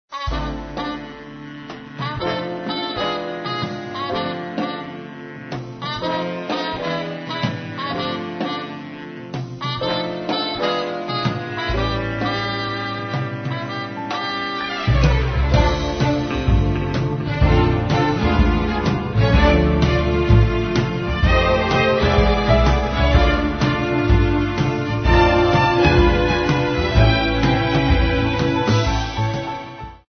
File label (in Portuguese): suspense